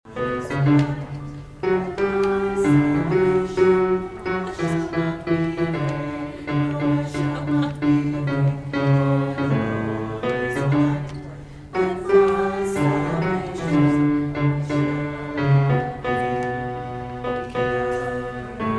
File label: The Lord is My Light alto